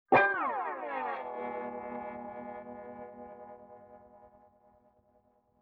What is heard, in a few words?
Guitar Slide Down; Vintage Cartoon; Musical Elements